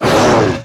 Monster Attack; Monster George Attack

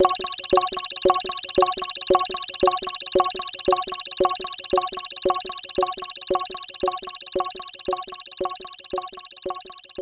Music; Music Frontend

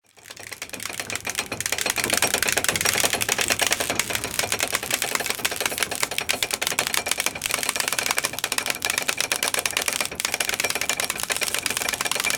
metallic gears turni

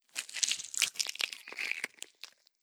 Spider Web Squish

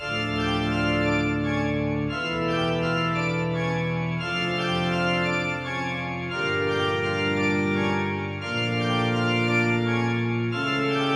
Loop

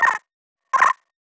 Player Idle

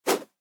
Dummy Attack

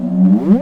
Energy Loop; Monster Jam Steel Titans

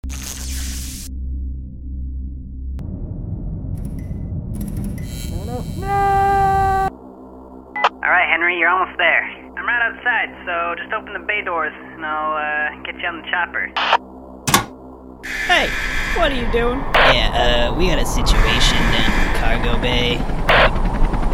Power reroute; The Henry Stickmin Collection

